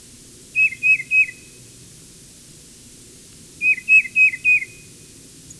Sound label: Tufted Titmouse; ibm glen